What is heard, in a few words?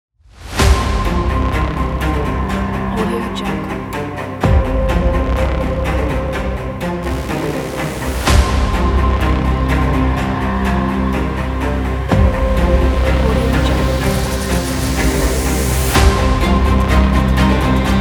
epic trailer